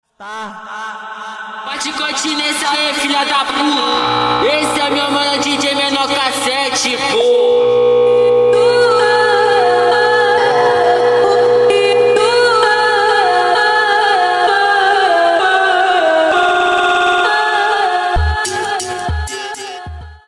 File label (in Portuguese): BIRIMBAU